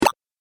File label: Game Digital Jump, Video Game Sound Effects; Twistedtracks